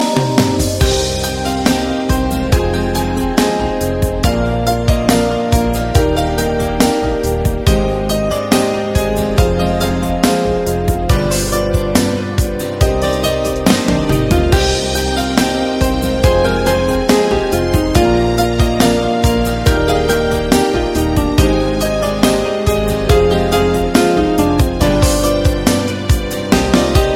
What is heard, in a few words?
nice piano; alternative